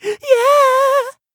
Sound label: Voices Yeahhh